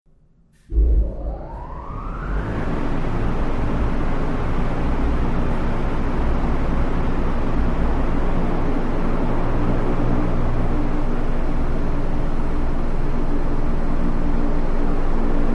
Power Up Large Wind T, factory

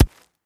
Concrete Chunk Drop; rocks & dirt

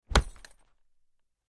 Chain Metal Hits

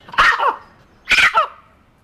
Macaw Call, Shriek x2

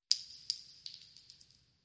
Shell Casings Single

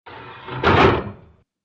Heavy electric breaker switch, large handle type.; SWITCHES AND LEVERS SWITCHES

